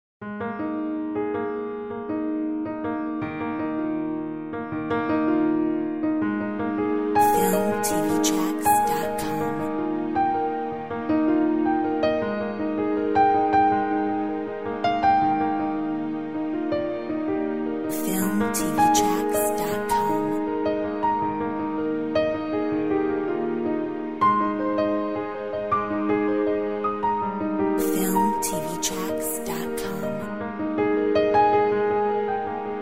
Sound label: Angels Orch